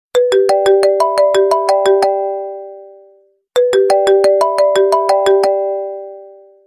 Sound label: Sms